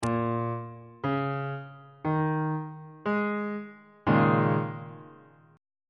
d sharp dominant 7th suspended 4th 2nd inversion on bass clef